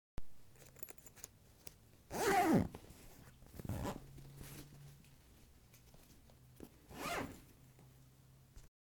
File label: Zipper